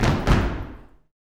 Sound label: YOUTH FEET, SLAP STOMP; Spectrasonics; Vocal Planet; Blues Soul